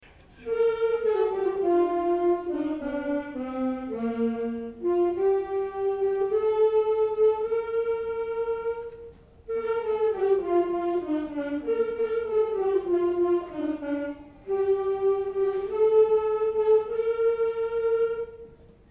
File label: Saxes